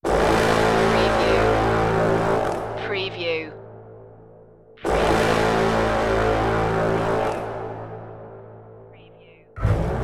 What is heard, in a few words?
dream stealers no choir